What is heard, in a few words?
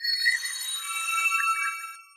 Crystal Shard Death